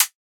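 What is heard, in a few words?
closed hihat; HiHats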